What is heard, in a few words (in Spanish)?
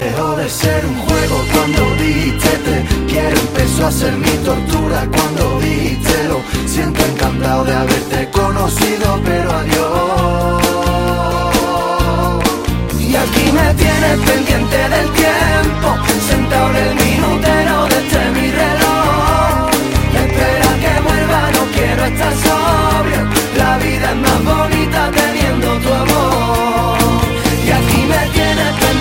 fondo flamenco